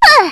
he bounce, hegrenade; weapons; CS ForKids

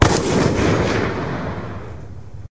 Gunfire

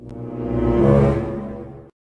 Suspense Medium

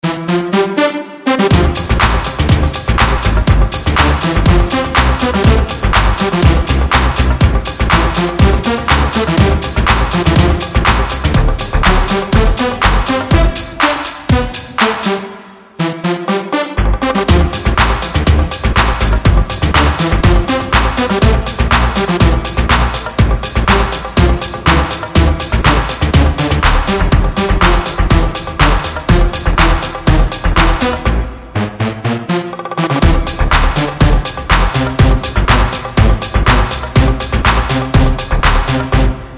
Instrumental

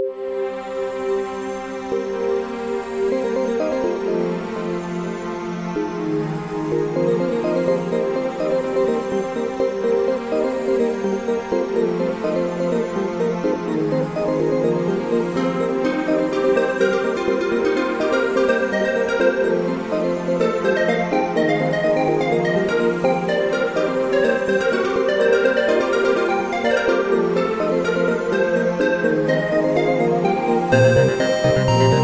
Mashup

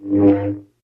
Lightsaber

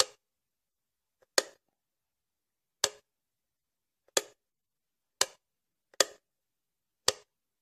Light Switch

